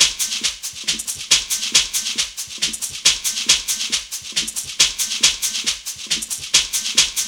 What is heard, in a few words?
SHAKERS; Zero G - Ethnic